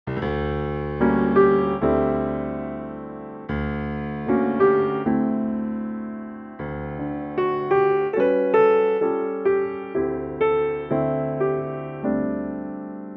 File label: piano G; key - G - vocal range - D to A; Gorgeous piano only arrangement; with no tempo in mind